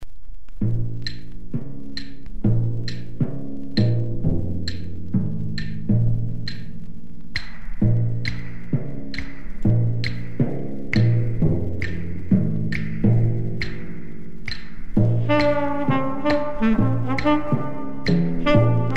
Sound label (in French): jazz trio